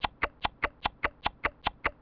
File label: slurp